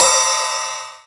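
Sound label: LIVE RIDE, MPC-60 DRUM; Greytsounds VOL-1; MPC-60 DRUM2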